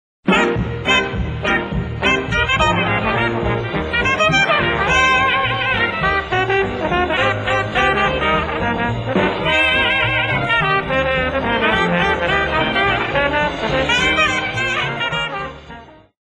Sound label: jazz